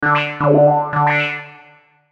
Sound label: Logo Triple Pulse; Sonic Logo; Blastwave